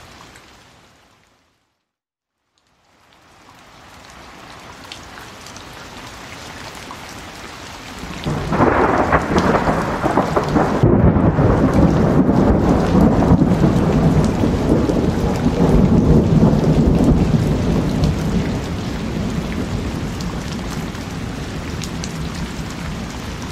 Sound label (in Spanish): Tormentas Naturales Lluvia